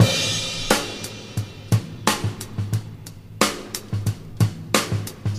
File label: 89 bpm drum loop sample a sharp key